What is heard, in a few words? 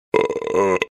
Burp up-close